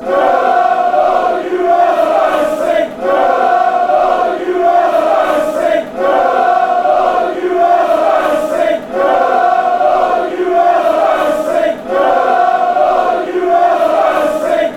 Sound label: chant; bolton